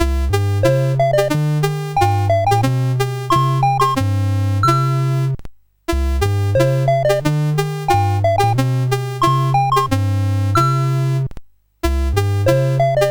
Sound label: Peg Jump BASIC, CSG8580R5